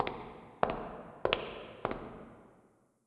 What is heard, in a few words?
Foot Step; blue archive